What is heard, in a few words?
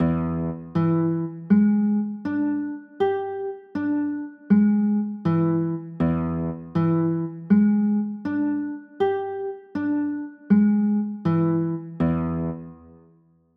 e 7 sharp 9, chords